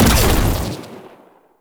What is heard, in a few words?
Shotgun Shot Fire; Pax Duviricus